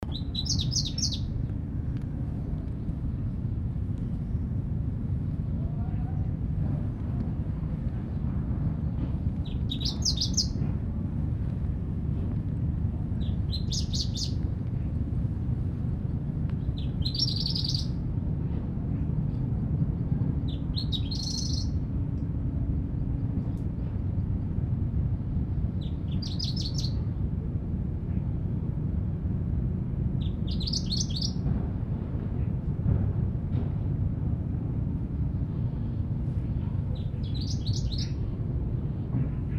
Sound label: warbler grey crowned Seicercus tephrocephalus; Craig Brelsford